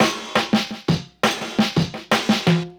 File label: D&B Rolls, Drums; neuro